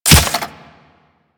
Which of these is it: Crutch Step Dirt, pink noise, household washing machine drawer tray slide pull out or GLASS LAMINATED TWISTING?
Crutch Step Dirt